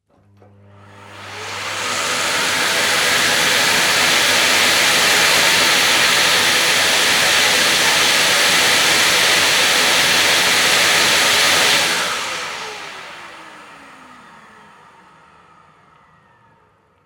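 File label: vacuum cleaner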